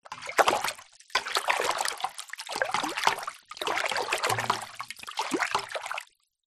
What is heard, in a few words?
household water stir in metal pan; zapsplat